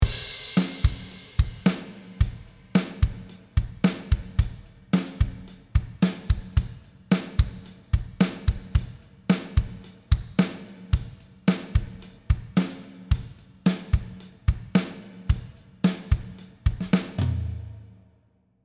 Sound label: altiverb 8 xl drums echo; audio ease; cello studios bill putnam